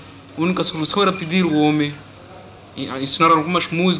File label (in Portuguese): Cantar e Pintar; Mocambique